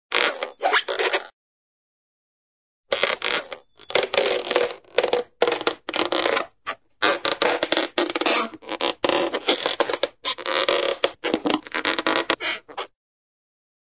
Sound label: squeak, cartoon short balloon squeak